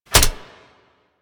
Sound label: Sword And Whip Catch; Warframe